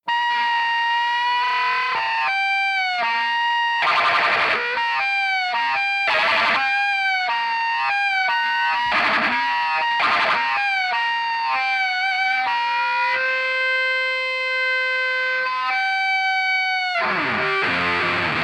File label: Instrumental